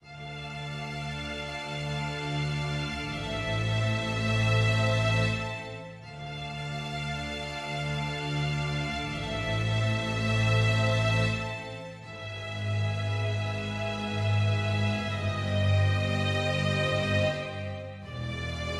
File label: sad forte